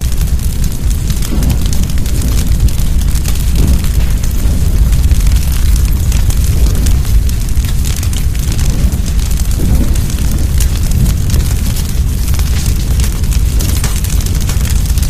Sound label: Fire Large; The Avali's Audio Overhaul